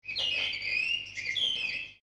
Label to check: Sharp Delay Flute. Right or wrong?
Wrong